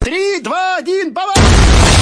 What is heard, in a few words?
boom